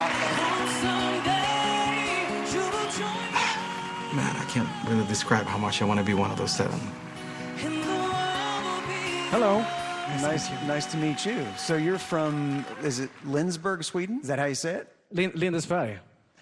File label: swedish singer